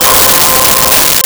Calliope C5; Nintendo; July 2020 Gigaleak

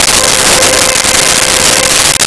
Soundtrack C5; Nintendo; July 2020 Gigaleak

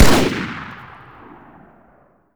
battle rifle, fire; weapons

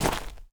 dirt run, footsteps; chief

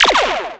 hyper laser; Nintendo; July 2020 Gigaleak

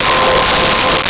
Solo Voice C5; Nintendo; July 2020 Gigaleak

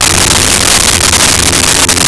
Cello F2; Nintendo; July 2020 Gigaleak

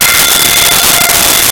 Harmonica F5; Nintendo; July 2020 Gigaleak

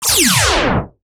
bomb shot; Player SE; Nintendo; July 2020 Gigaleak

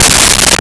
Pick Bass C3; Nintendo; July 2020 Gigaleak

